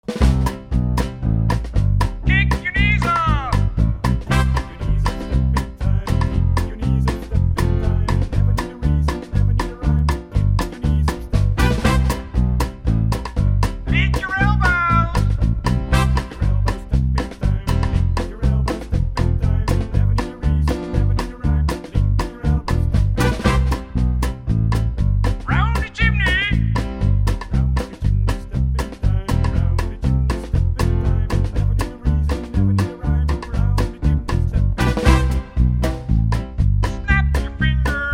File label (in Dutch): instrumentaal